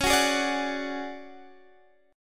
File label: D Major 7th Flat 5th Db, chord